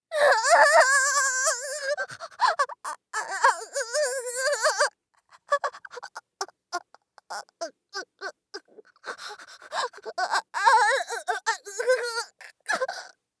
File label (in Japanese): 痙攣発作; 効果音なし